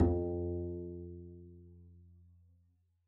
pizz f2, double bass

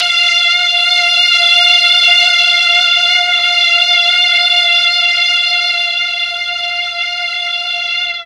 F3 16 VIOLIN, mellotron; TRON 16VLNS

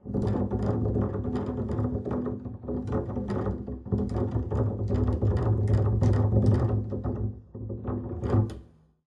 pizz noise strum long extra, double bass

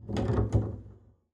pizz noise strum long, double bass

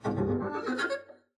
double bass arco noise jumpy

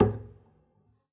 pizz noise mute g, double bass

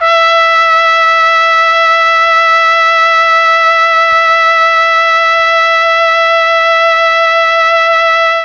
E3 TRUMPET, mellotron; CHMB TRUMPET

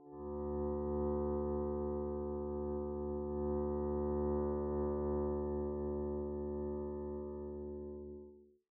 arco d2 pp up, double bass

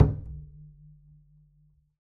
perc, pizz; meatbass

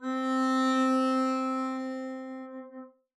arco c4 mf down, double bass

